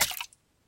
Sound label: cola pickup